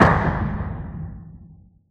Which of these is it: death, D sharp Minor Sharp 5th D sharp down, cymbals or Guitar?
death